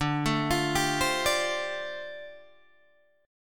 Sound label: D Minor 11th D down Guitar Open D